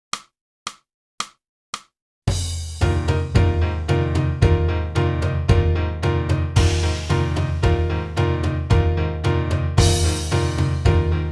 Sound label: sax